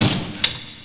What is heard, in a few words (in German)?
Fenster Auf